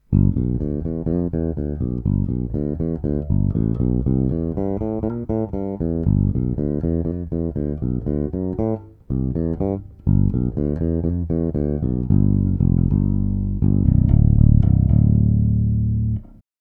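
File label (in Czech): Warwick RockBass Infinity 5 Fretless, Warwick Red, dvojcívka sériová - jednocívka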